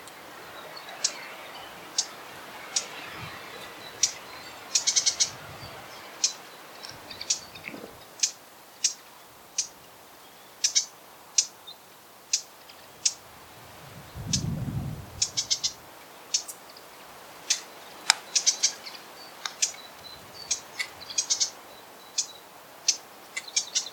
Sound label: Lesser Whitethroat; Holywell Pond; passerines